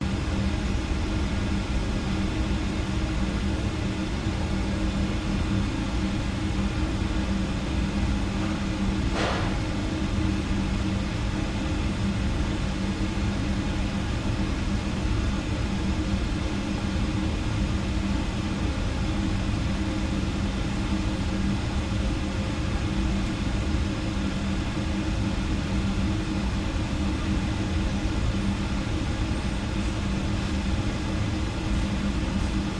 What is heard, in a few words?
German Bakery Proving Oven; your free sounds